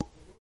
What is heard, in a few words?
Button; 3DTD